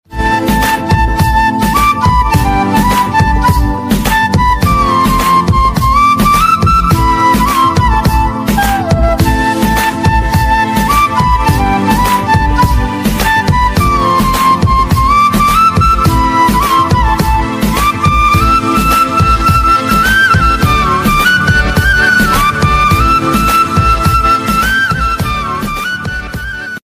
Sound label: Romantic Hindi Music Ringtone